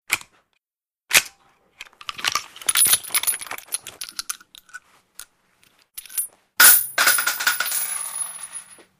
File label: Unload Shells Concr, handgun